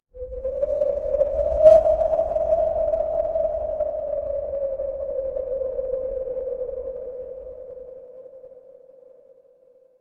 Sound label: ambience wind